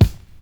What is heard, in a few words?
fresh clean kick f sharp key; kick drum; kick drum samples